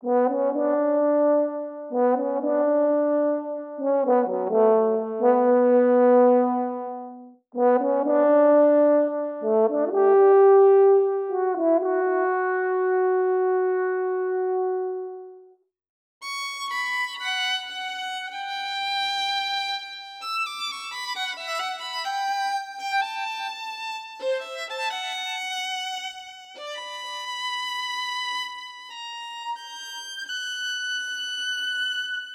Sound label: Ensemble Duet